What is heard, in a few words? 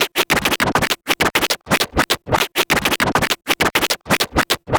Vinyl Scratch Clap; Digidesign; Pro Tools; Pro Tools Essential Loops; Dance DJ